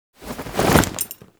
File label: Lasrian APC Jump Off Front Left; Enemies; Warframe